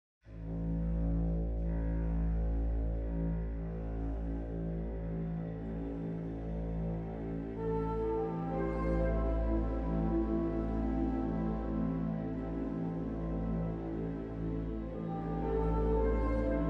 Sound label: mystic forest pond 65bpm; Hartwigmedia